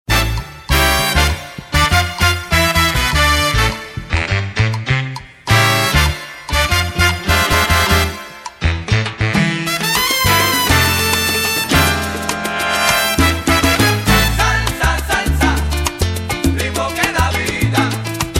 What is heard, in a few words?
Salsa